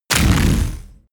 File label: sci-fi plasma cannon fire